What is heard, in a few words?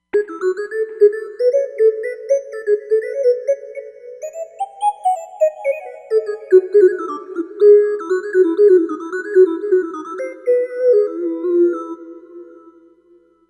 Chubby Lead, Roland Fantom XR